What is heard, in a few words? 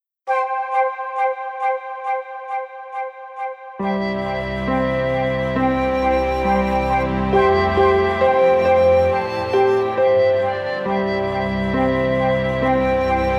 Flute of the Andes